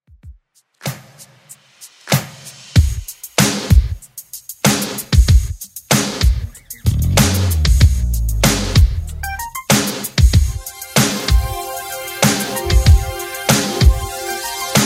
70s Redrum 95bpm

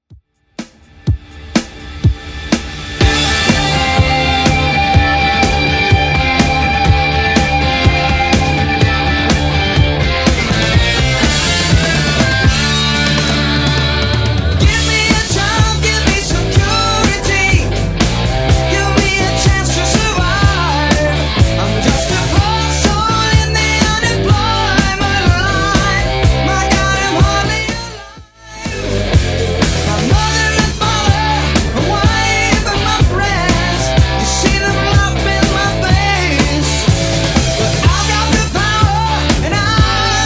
70s Rock Redrum 124bpm

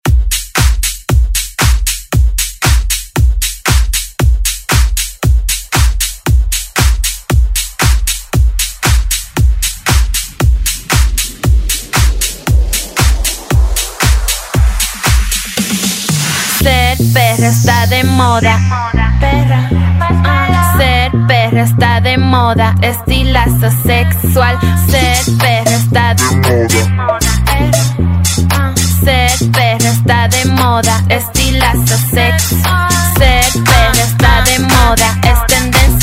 Latin Dance Redrum

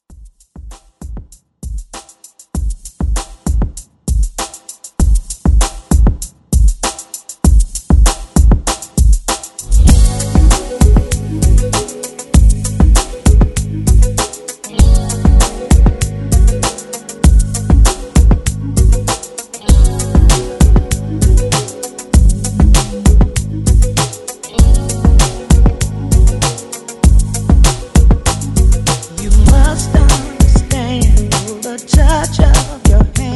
80s Pop Redrum

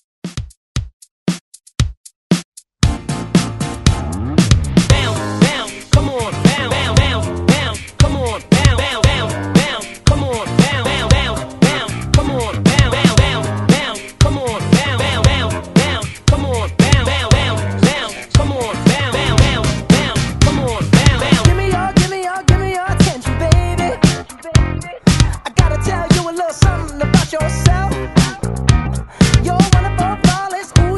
Hype Redrum 116 Bpm; DJDOLLS

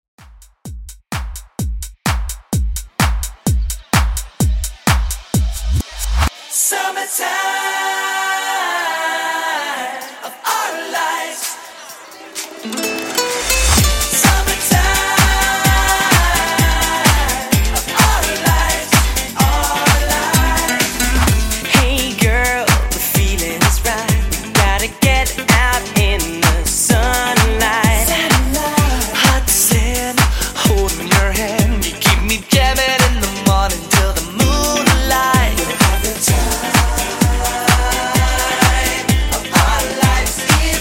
friendly redrum 128 bpm

